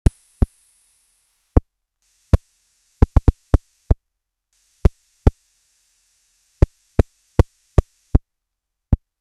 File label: parkour backflip